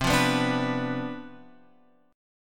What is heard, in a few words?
C Major 7th Suspended 2nd Suspended 4th C, chord; CM7sus2sus4 Chord {8 8 9 7 X 7} strummed